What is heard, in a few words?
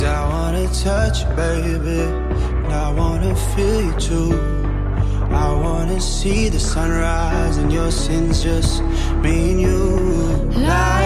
New Hollywood Ringtone